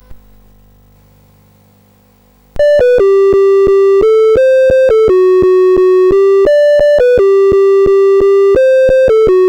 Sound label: BASIC sid CSG8580R5; Cube